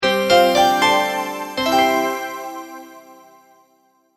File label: multimedia logo, Musical Identity/Signature; Short Musical Signature / Ident; Sonic Logos; Sound Design; McKinney Sound